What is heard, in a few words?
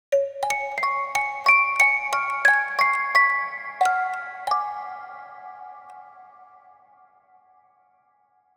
Music Box Horror Tune